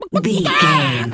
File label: chicken cluck to scream